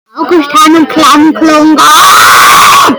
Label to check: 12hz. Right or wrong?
Right